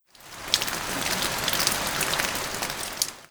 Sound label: Rain